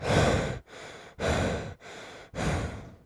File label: Breath